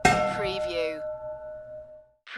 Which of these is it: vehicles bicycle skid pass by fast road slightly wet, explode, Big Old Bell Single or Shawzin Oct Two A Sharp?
Big Old Bell Single